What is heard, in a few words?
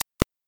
arrow shot; ludumdare; ld31